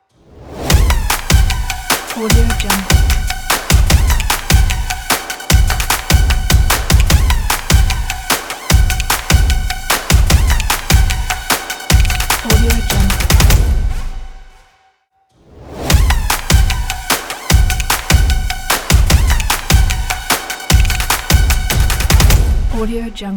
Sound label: fashion stomp opener kit